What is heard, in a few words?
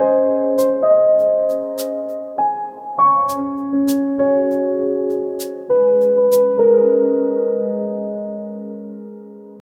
relaxing ambient lofi pia